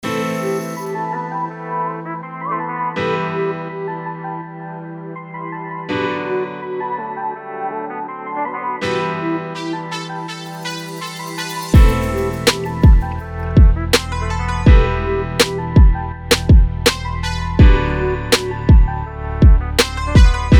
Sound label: Amazing Beat